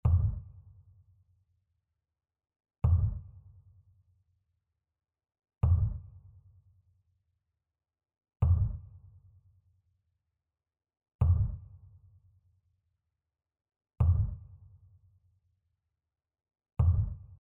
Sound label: Session Noise Kick